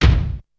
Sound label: crispy kick drum sample c sharp key; kick drum samples